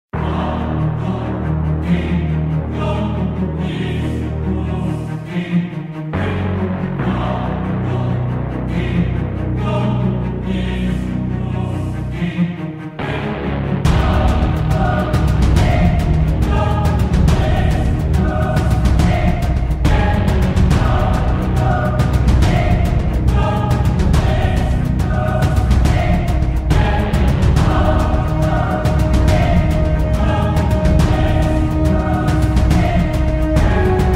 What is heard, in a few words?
Epic Massive Heroic Action Orchestral Choir Powerful